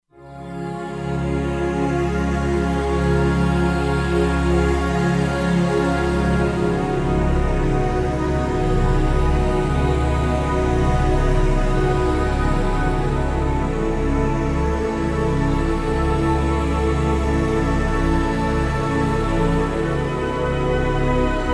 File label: Motion Pad, Roland Fantom XR